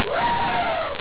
ELEPHANT

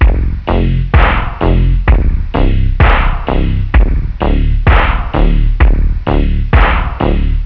POP BEAT

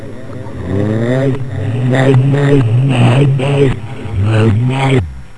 EVL ALIEN

